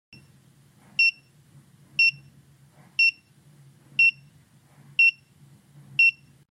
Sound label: buzzer sound